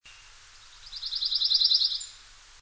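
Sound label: Pine Warbler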